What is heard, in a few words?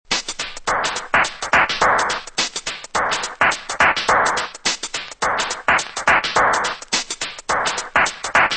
drum loops